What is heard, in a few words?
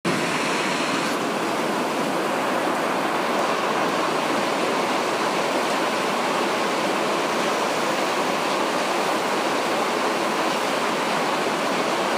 Maine Thunder Storm